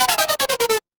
retro game game over

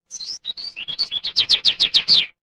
animal bird

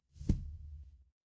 impact thud

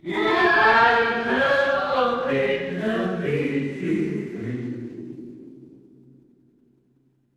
crowd cheer

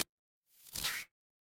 cartoon stretch

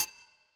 door doorbell

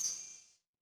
feedback success

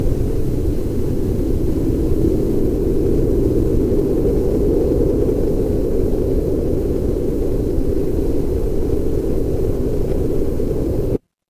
ambience wind

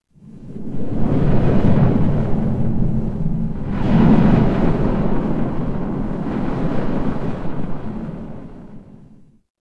ambience ocean

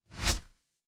ui swipe

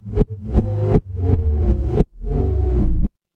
mechanical engine

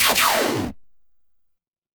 retro game laser

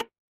cartoon bonk

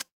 ui tick

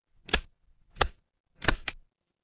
office stapler

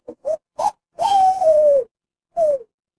animal owl

